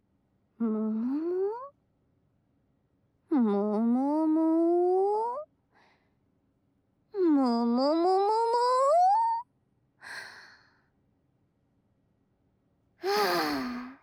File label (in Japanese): 鉱物つながり、銀の耳かきでの右耳耳かき; 昔話安眠導入; 蓄音レヱル むむむ; CV.楠木ともり